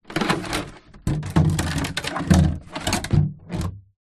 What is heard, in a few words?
foley water cannister plastic 10 ltr movement concrete ground; zapsplat